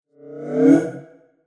Future Vroom, whoosh sound effect

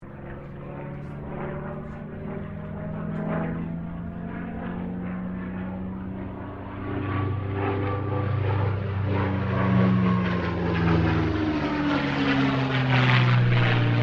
AIRCRAFT CAC CA-18 MUSTANG MK23 LOOP; CA-18 /P-51 Mustang; Airplanes; Vehicles; Aircraft Collection; Twistedtracks

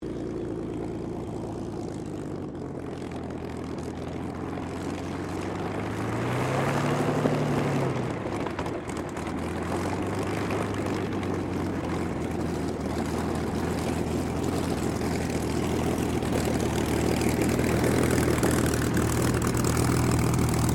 AIRCRAFT SUPERMARINE SPITFIRE MK-VIII SHUTDOWN; Airplanes; Vehicles; Airplane Sound Effects

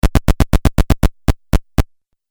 Footsteps Video Game

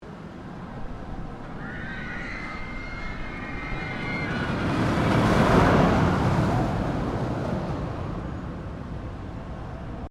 ROLLER COASTER TOKYO DOME PASSES, Amusement Park; Roller Coaster in Tokyo; Amusement Parks

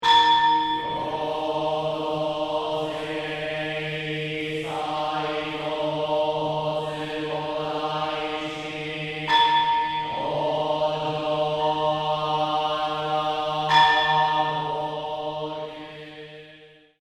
Temple Monk Chanting Tokyo; Temple bells and Buddhist monks chant in a temple in Tokyo, Japan; Zinjo Ji; Chanting Sound Effects

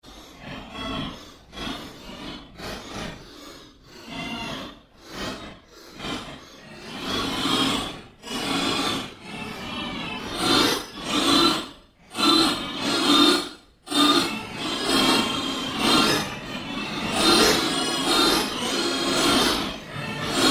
Ambient Drone Ghost Chatter; Sound effects created by manipulating other sounds; Scary Soundscape; Scary Soundscapes

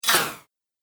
Droid Flyby Shot, robot; Robot Sound Effects

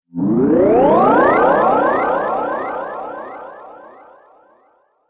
Cosmic Magic Effect; Magic Sound Effects

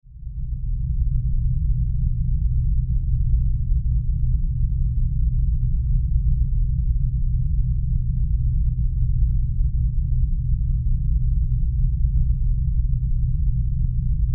Sci Fi Rumble Space Ship Deep Space Subsonic; Spacecraft

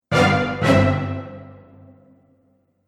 Bold Orchestral Accent; Musical

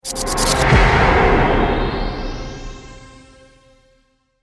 Radio Stinger; sound design; Stinger Sound Effects

